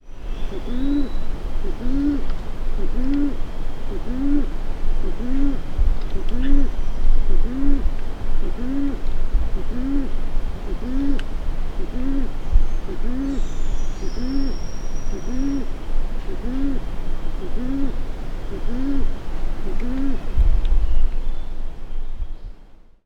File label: Patagioenas fasciata Paloma torcaza septentrional